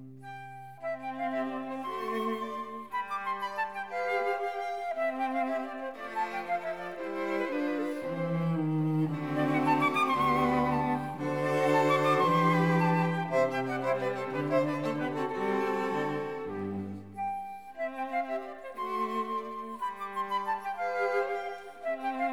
Quartet for flute, violin, viola & cello in C major; K. Anh. 171 (K. 285b); Mozart; The Flute Quartets